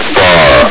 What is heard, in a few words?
fire, hgrunt; Source Engine; bshift